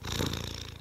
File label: Purr